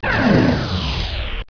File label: k rifle; asciisec